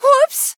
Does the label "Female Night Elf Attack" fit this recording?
Yes